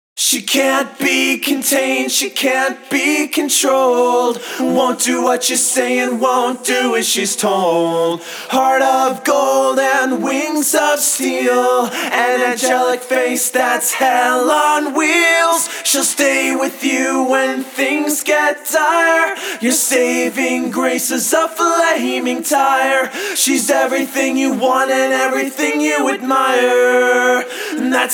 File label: Acapella